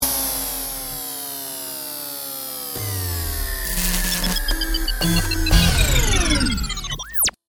Stinger Power, Production, Element, Stinger, Phone, Ring, Ascend, Hit, Dark; BlastWave